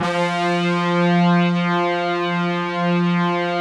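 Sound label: synth brass f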